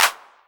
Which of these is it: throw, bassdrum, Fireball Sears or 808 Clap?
808 Clap